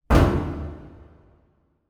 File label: impact metal large reverberant industrial